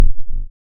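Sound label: waveform FM triangle sine envelope silence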